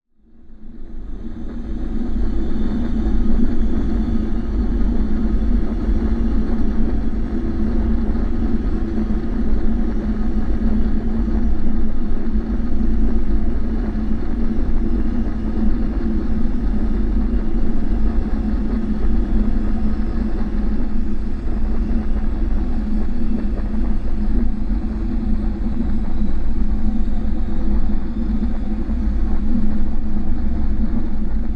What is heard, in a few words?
Dark Rumbling Ambience